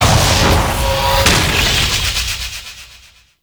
Landscape Heat Fissure Close; Gameplay; Warframe; Venus Open World

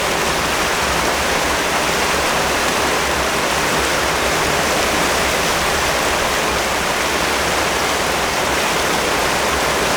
Waterfall Huge Loop; Ambience; Props; Warframe; Eidolon Plains

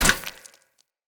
Sphere Polearm Medium Impact Rock; Player; Environment

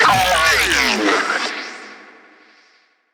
Necramech Ranged Overheat; Battle Barks

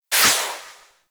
Full Draw Tail; Warframe; Infested; Cernos

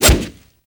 Wolf Swipe, Animals; Duviri

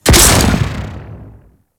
Drop Ship Explosion; Warframe; Ambience; Infested; Golem Trial

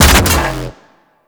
Detron Shot, Weapons; Warframe; Void Trader

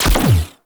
Crewship Turret Fire; Warframe; RailJack; Corpus Crew Ship

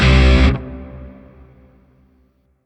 Shawzin Pent Min Chord I; Music; Warframe; Grineer; Chords

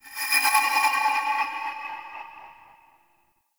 Distant Metal Textures, Asteroid; Warframe; Grineer

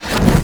Overhead Smash Attack Pre Hit; Enemies; Warframe; Grineer Nightwatch

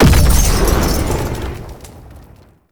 Flight Start Impact; Warframe; Uriel; Infernalis

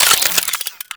Modular Secondary Handle Slow Reload, Guns; Warframe; SU Modular Guns; Reloads

